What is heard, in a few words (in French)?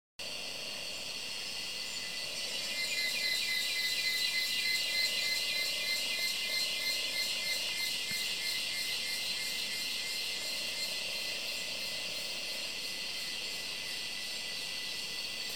bruit de la jungle